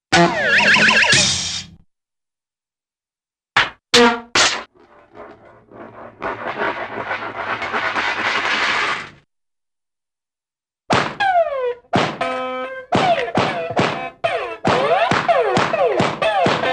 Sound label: Bulb Horn Bonk - Crashes; Cartoon; Hollywood Edge; Cartoon Trax